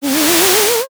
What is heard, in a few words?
drawer open; daily life